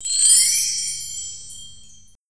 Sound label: medkit use; fps game